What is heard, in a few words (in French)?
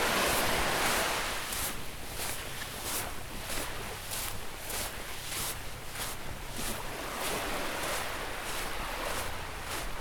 plage pas